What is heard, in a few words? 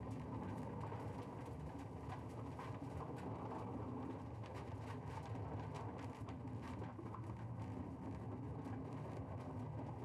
trolley wheels loop; mine